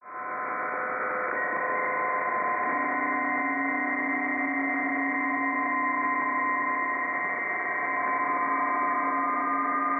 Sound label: original psk31; KA3WCA; PSK31 Transponder Design Page